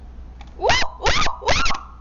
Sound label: whoowhoowhoo